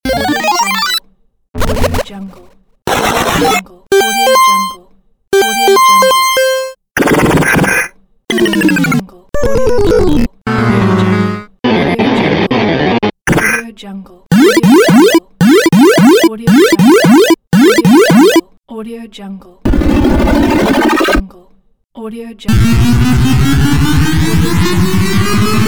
arcade video game; arcade video game pack

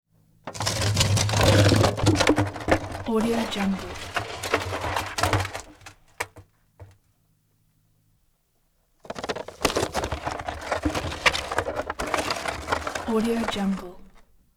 wood barrel junk

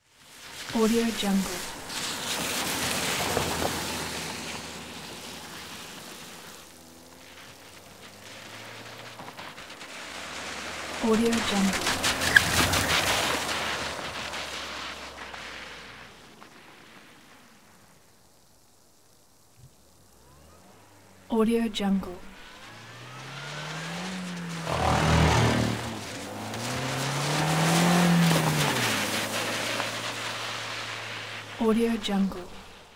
car driving on wet road

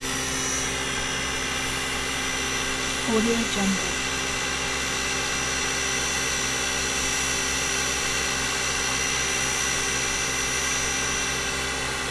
baldwin 282 steam locomotive built 1923; prosoundfx

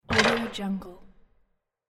basketball rim shot

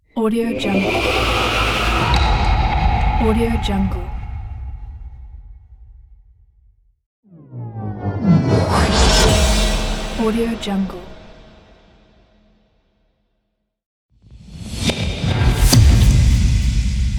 punchy cinematic transitions

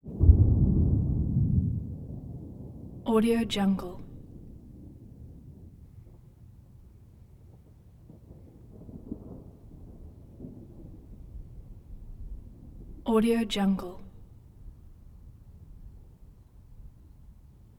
thunder roll distant rumbles some wind clap

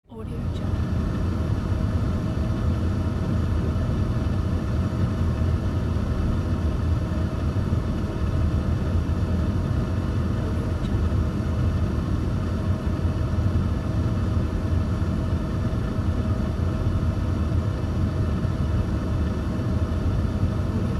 mid speed car ventilator

